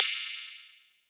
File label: Ride, cymbals; Rides; Future Bass; Drums; One Shots